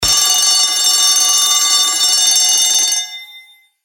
bell Ring; Dynamite Digger Eureka

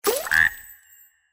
frog Leaps; Enchanted Prince 2; Eyecon

